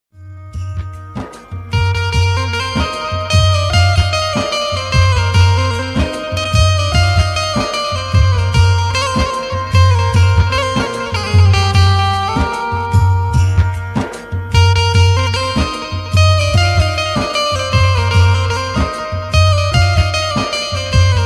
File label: instrumental ringtone; 15 august